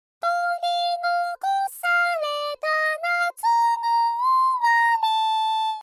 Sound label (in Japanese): 006（高い）